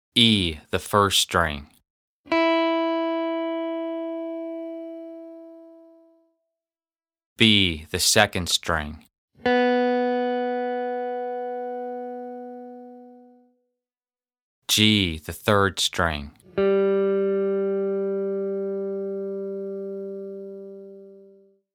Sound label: Open Strings